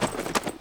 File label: Rustle Body Movement Crouch; SFX Kit; Foley - Rustle